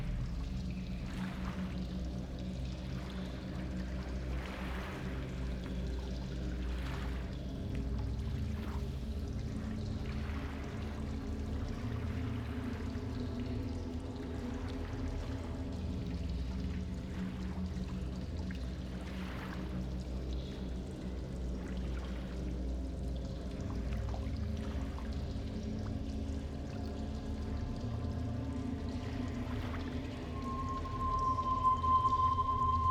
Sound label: Ocean Temple Depths; drowned